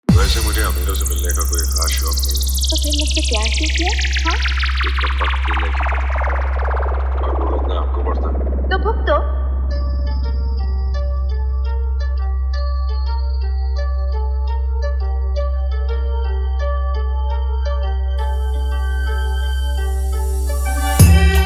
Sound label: Old Hindi Love Hard Duffli Vibration Remix